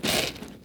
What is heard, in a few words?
foot snow, footsteps; midnight guns